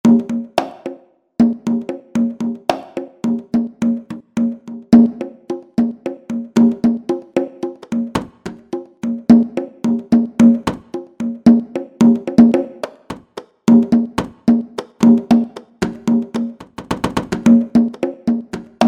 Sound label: Congas; IK Multimedia; SampleTank Free